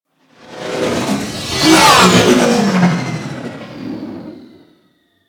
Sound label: Fighter Ship Close Fly By; Space Fighter Crp Ship Engine A